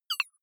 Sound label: click hard high